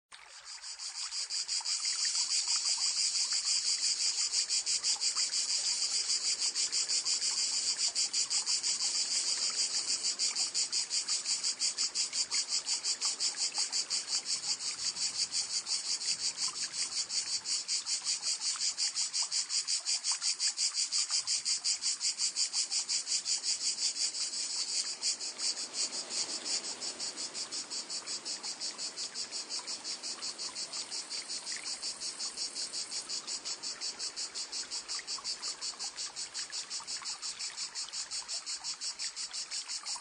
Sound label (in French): chant cigale